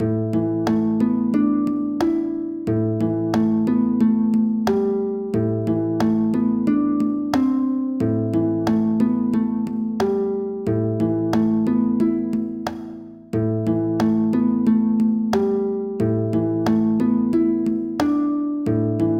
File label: bgm; chibi